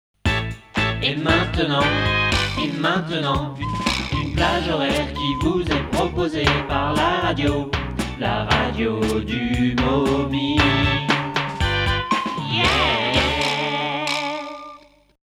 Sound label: jingle; MOMI